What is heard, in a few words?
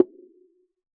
jungle kit bongo